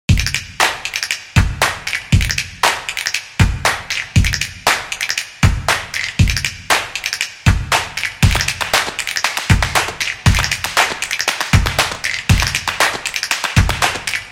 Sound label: Upbeat Drums Music; soccer drums